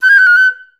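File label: flutes F#5; Best Service; Peter Siedlaczek's Advanced Orchestra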